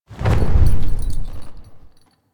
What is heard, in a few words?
Horse Glide Wing Flap Ambient; Vehicles; Duviri